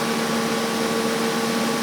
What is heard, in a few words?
Vacuum Cleaner; Appliances; 1001 Sound Effects